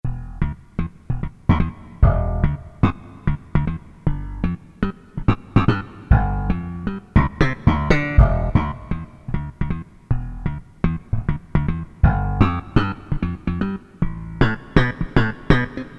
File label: Vel-Bass, Korg DSS-1